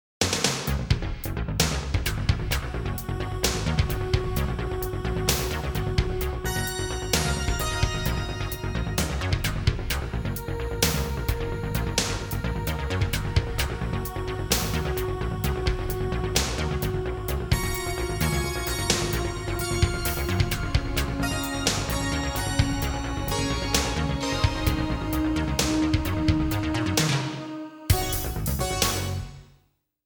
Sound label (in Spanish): Musica fondo; Cinepolis